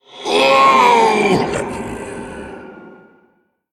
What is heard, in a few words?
Male Elemental Death